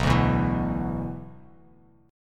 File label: B-Minor Double Flat 5th B down, Bass Standard; Bmbb5 strummed; JGuitar